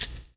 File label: TAMBORN; E-Lab; X Static Goldmine 2